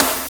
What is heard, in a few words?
SNR HI-TE, Snares; Roland - Rhythm Section; Snares 6; Sn Modules 6